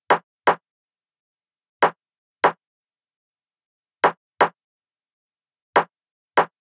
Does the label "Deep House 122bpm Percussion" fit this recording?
Yes